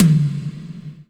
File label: Mid Tom, Percussion; Giga Samples Collection; ELQ-DrumQit